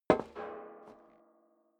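step dress boots metal rattle single right, footsteps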